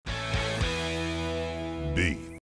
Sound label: Beef Tone